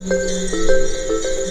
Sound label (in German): KLIMPER LOOP; ATHMOSPHERE